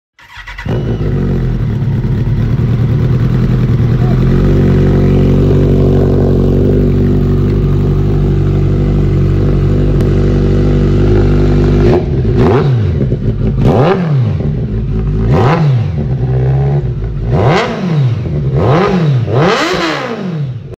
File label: t rex motorcycle; موتور تی رکس